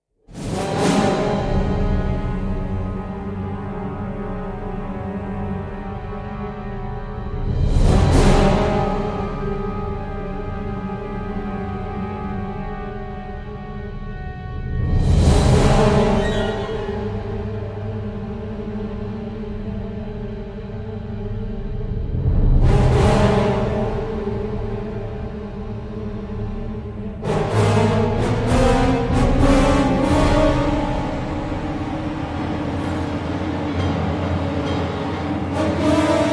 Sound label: no choir